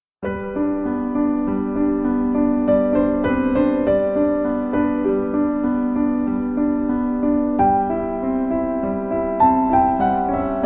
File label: Classical Music for Birds; pet-relax